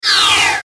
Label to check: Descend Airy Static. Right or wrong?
Right